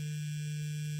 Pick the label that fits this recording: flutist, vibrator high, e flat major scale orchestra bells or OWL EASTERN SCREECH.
vibrator high